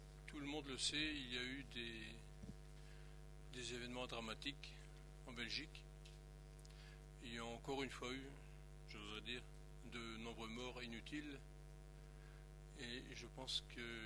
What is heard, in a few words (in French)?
Minute de silence; Hommages aux victimes des attentats